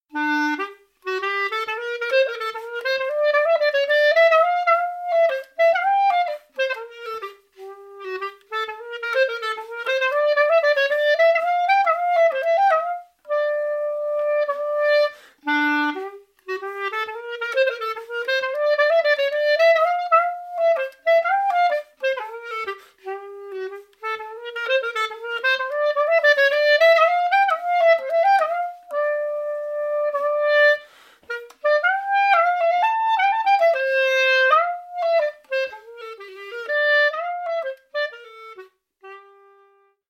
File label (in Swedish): klarinett polska; orsa; efter brand jon larsson